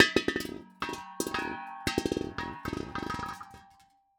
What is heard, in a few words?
Impact Tank Propane Tank Dropped On Cement Floor